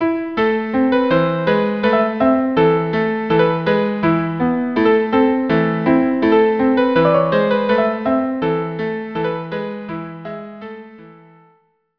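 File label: viola duo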